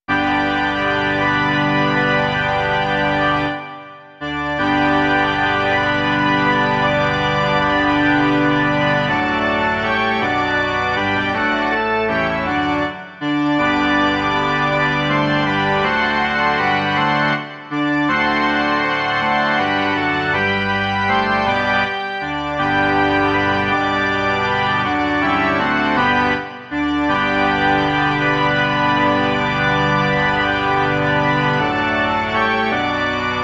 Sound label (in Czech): varhany; Narodil se nám Spasitel